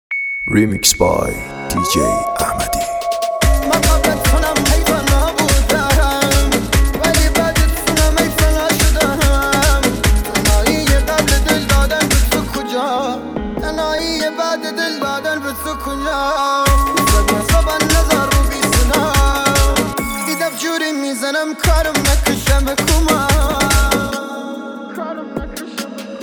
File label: music kordi ghamgin mashin